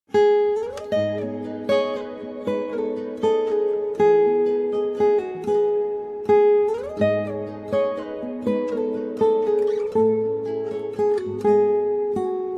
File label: bakhtiari music